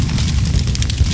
molotov explode

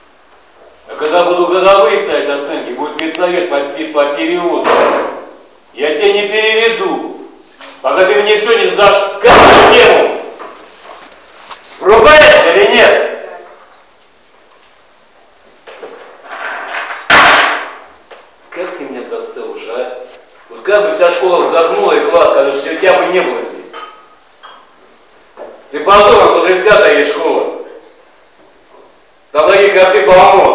kabinet

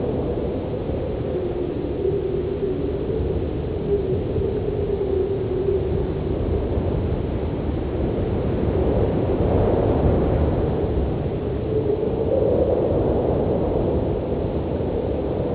wind mine

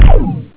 fire charge, sentry gun; CSSB

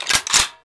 mg36 bolt pull; weapons

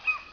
gull

